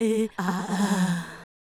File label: Fem Vocal 128bpm G FX, African Voices